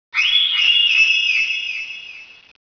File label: f scream; ambience; rebar